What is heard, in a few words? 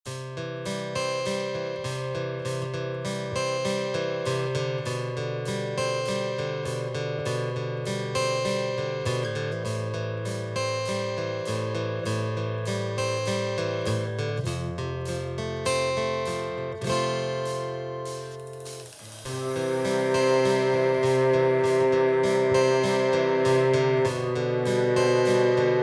clear your mind